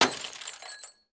Globe Break; Hollow Knight (gamerip) (2018)

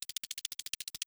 Health Bug Run

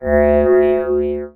Ghoul Death Voice

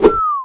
Pot Throw

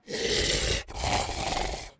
Enemy Woodsman Idle

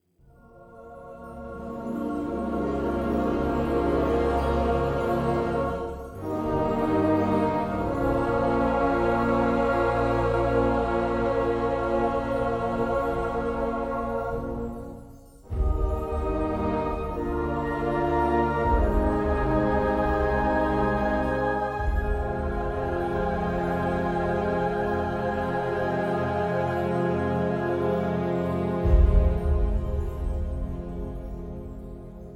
castle music

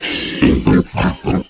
Troll Monster Laugh

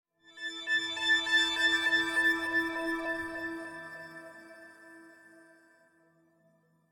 Progression Stinger; Botanist

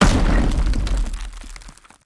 Chest Destroy; Valthirian Arc Hero School Story 2

